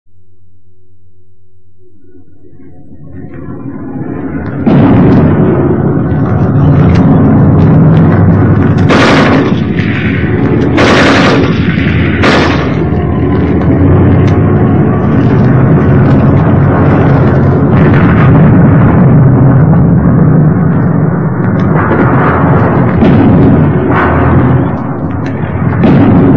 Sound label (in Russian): letit samolet i strelyaet